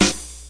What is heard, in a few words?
snare